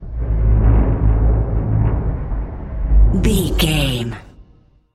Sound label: metal low creaking ship structure